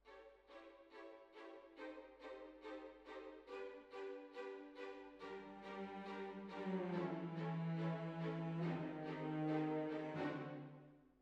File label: Hall, Jazz for piano